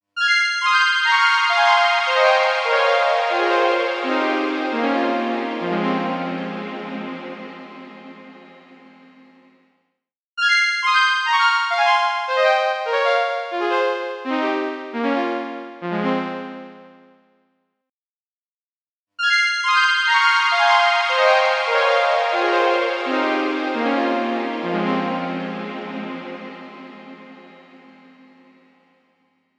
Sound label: Synth; FloatingInSpace; H90